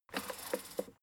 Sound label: fader plastic stutter regular